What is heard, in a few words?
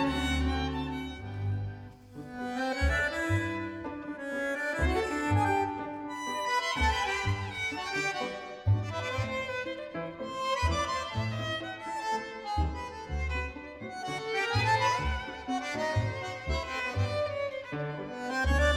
for Solo Violin and Chamber Orchestra; Daniel Hope